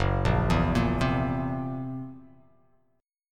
Gb-7th Flat 5th, guitar; arpeggiated; JGuitar

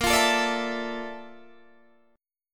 Bb Major Sharp 11th Bb down Guitar Open D, chord

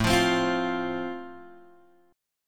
A Minor Sharp 5th A Guitar DADGAD, chord

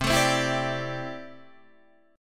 C 6th Add 9th C down Guitar Open D

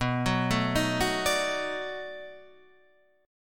B 7th Sharp 9th, down, Guitar, Half Step Down

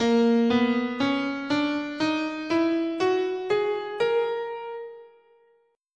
A sharp Eight tone Spanish, scale

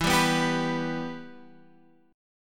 E-Minor, Guitar, Standard; strummed; JGuitar

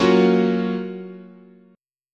F sharp major 7th, chord

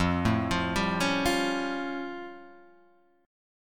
A Augmented 7th F

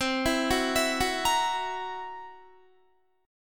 C 7th Flat 5th C down Guitar; C7b5 arpeggiated

